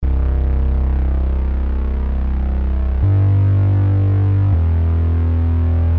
Starter bass melody

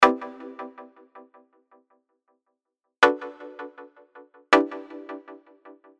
full harmony

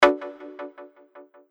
2nd Chord

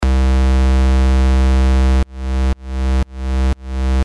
Sidechaining

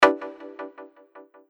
3rd Chord

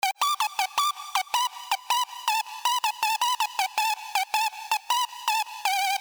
Melody Layer

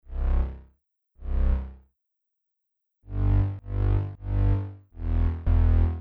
Bass Group mid and sub

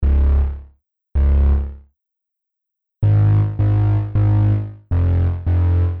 MID bass melody

